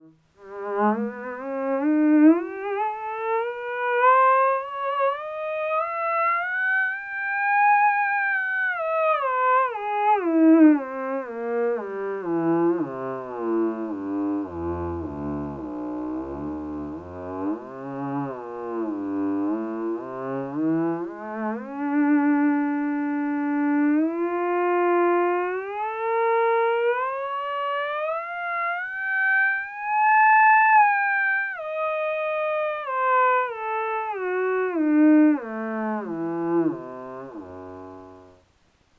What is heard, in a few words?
ews resonator, theremin